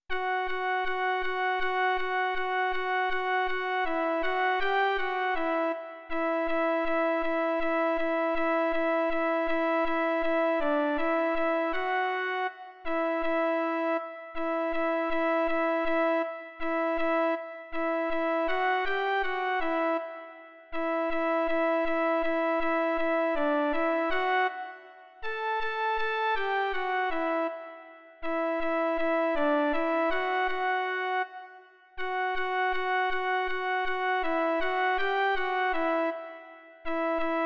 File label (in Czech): jen melodie; Když Pán Ježíš; Doprovody Farského liturgie